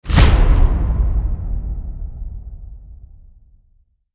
pop; ranked splash text

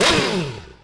weapon; Cafe Lancer; Crossbow

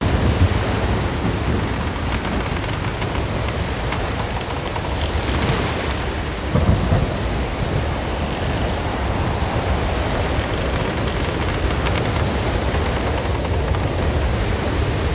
ambient; tortuga ship

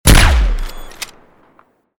weapon; Anti-matter

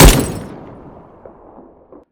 weapon; Prism

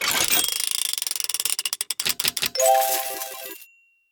inspect spin; Monte Carlo (inspect)

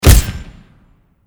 weapon; Silenced; Desert Eagle

